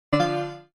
character lvl; sweetcandymania

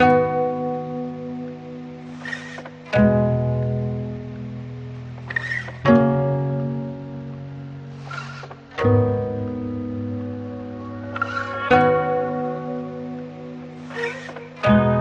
music menu; tictactoemania

